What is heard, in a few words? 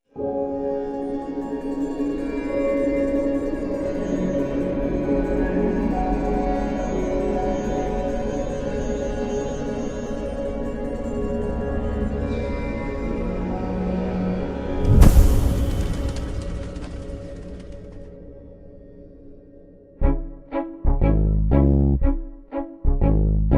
no vocals